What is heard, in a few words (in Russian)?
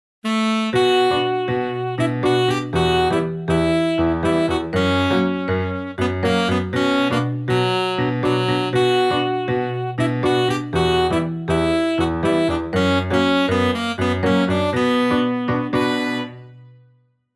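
веселый разнообразный фон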